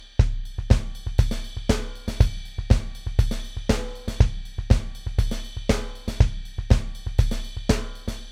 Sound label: drum replacer